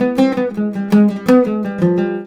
LUTH, Arabian Traditions; USB Soundscan vol.43; AKAI

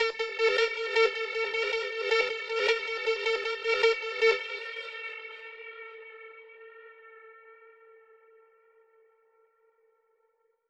alarm siren, Verb Proc, 110bpm; musicradar

